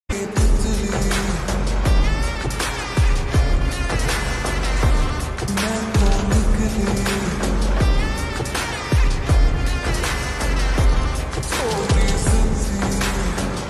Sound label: slowed reverb hindi ringtone